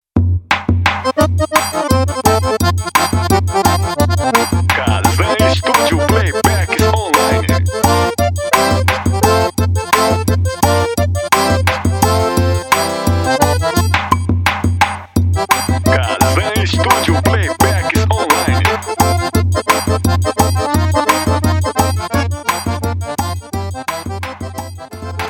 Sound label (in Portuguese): playback tamborete de forro